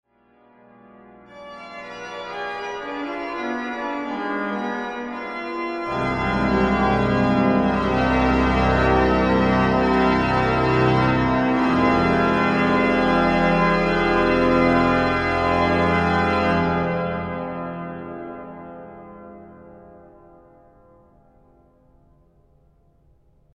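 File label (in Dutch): Allegro In Bach Stijl, orgel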